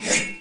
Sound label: ax swing; insurrectionist